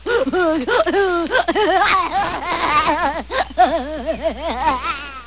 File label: laugh